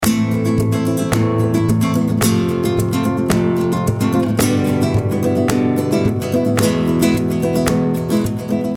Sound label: All Loop